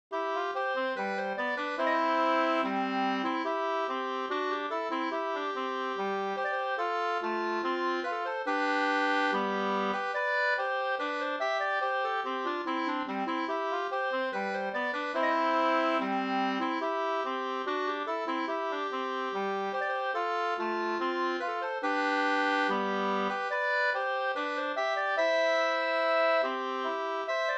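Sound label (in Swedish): brudmarsch Gaslaten Uppland 2 stämmor; Gas-Anders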